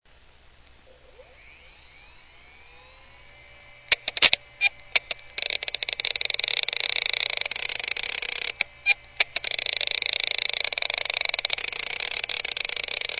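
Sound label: ibm 40gb bad heads rattles squeals, hard disk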